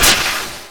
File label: impact; viper